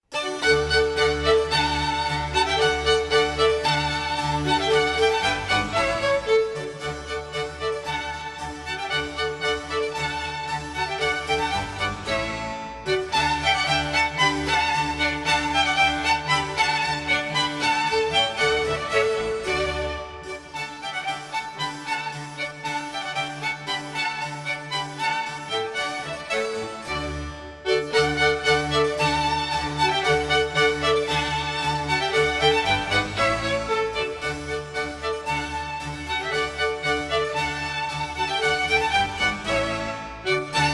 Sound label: Strings Spring